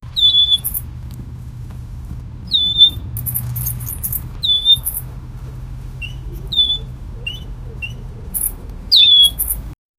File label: a cute rabbit is riding, bicycle